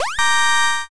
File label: hero pose